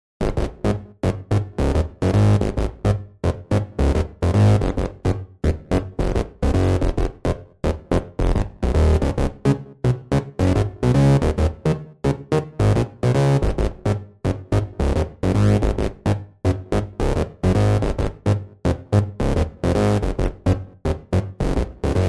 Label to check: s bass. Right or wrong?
Right